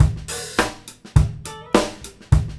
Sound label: GROOVE